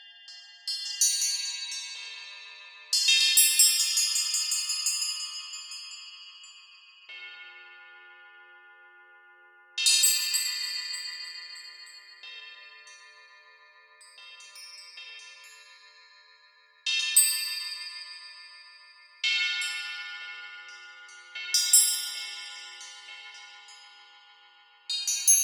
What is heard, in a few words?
Cymbals; Orch Chimes; Sound Design; KONTAKT